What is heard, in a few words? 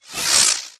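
Drone Movement Turn; Titanfall 2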